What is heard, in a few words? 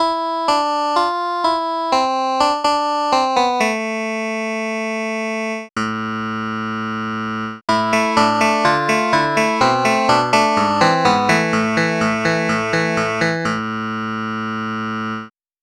clavinet; SpectMorph Evaluation #4